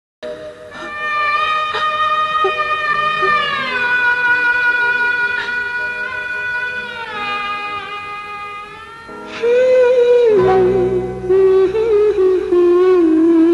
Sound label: Sad Duet; kanoon ki zanjeer 1990